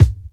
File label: low kick one shot g sharp key